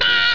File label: pain; quake2; penguin